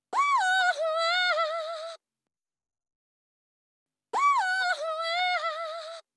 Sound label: transpose +5